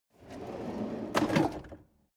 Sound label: drawer medium open draw short